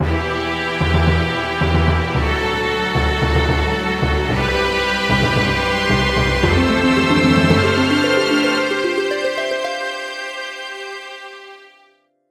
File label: fanfare; Open source MIDI music by Fredrik Johansson